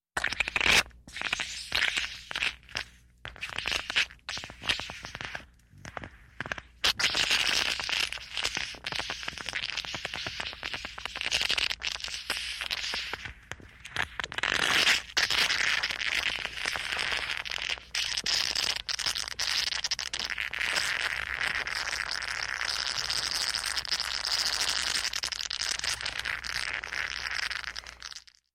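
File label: hose ran out of water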